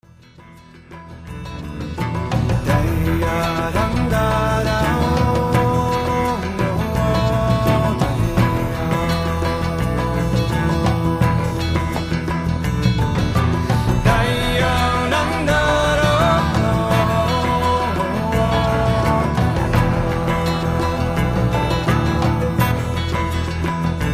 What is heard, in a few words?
Celtic Swamp